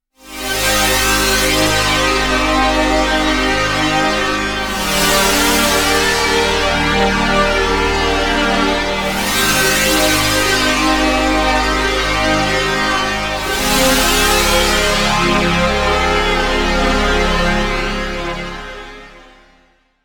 String Ensemble; KORG Tube

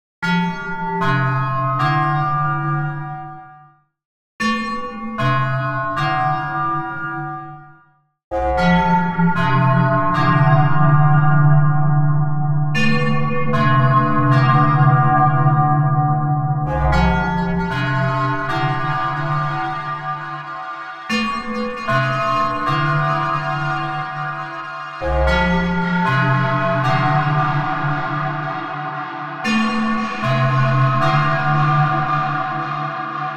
Bell; Dispersion

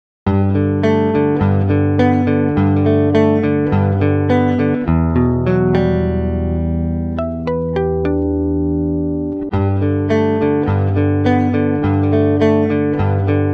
Electric Guitar

